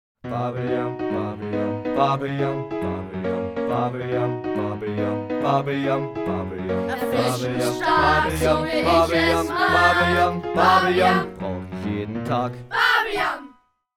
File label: Jingles; Bubble Yum